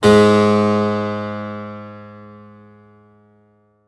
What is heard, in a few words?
HARP G#2